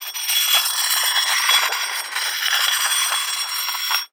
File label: Hole Saw Scrape; Hole Saw Bits